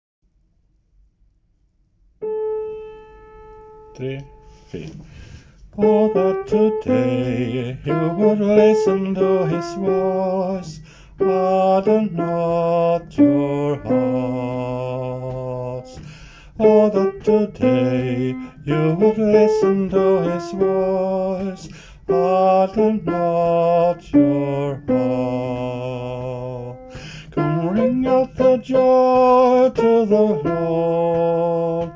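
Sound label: chorus and first verse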